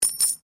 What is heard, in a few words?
Coin Penny Multiple Drop Stone; Common coins; Money; Objects